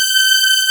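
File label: synth, Fairlight; East West; Global Sounds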